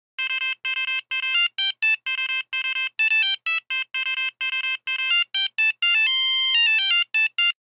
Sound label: Nokia Buzzer; 5110; William Tell; Windows Phone apps